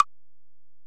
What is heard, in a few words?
Ac Perc, Drum Machines Alesis DM-PRO